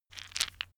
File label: stone gravel clatter short; natur-tiere; steine